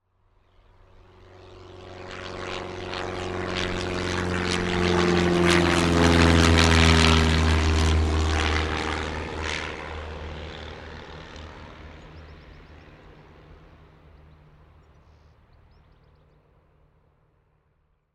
transport aircraft airplane light prop pass overhead take off